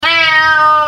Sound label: meow